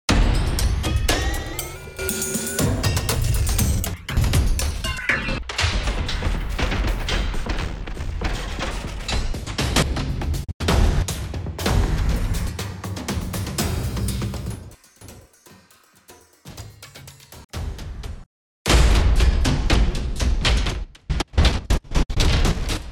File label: DAMAGE